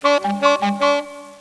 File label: horn; PUPPIES